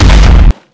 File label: hull hit